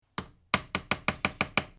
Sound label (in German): Plastikloeffel abklopfen